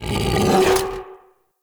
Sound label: Battle Dog Attack Bite; Animals; Vocals